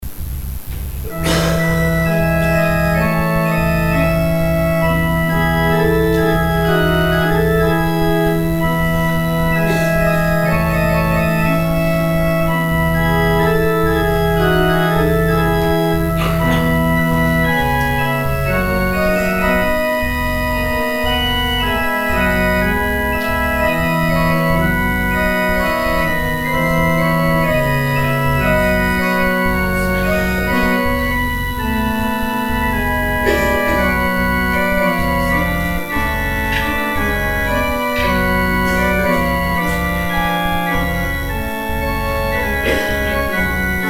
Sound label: organ interlude